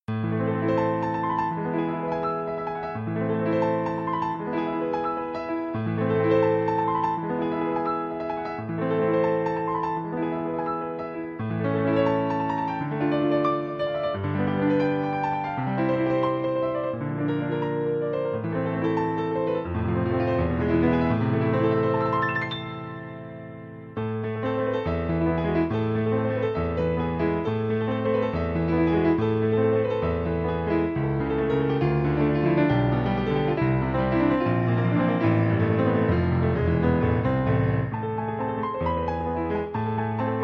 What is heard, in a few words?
Caprice No. 24 Piano; Paganini